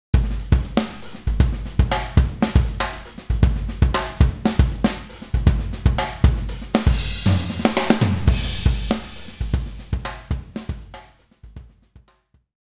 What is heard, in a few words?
sd comp groove; toontrack